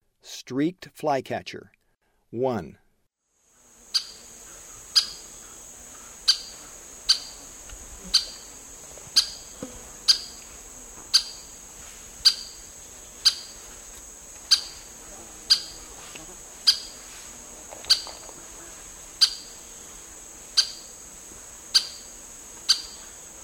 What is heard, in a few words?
Streaked Flycatcher; S. America; Eastern Lowlands